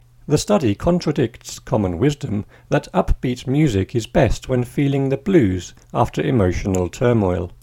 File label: sad music